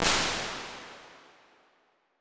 Metallic Room, impulse responses, large spaces, rooms; Apple